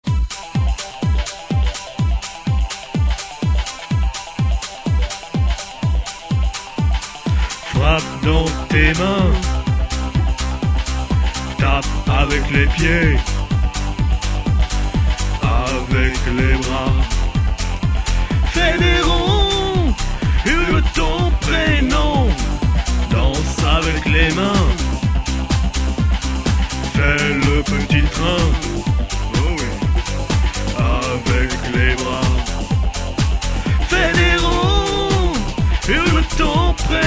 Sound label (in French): danse final